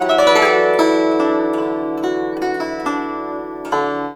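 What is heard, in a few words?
guzheng; Spectrasonics; Heart of Asia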